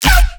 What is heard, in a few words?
bass hit yell; Virtual Riot